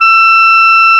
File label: Oboe A4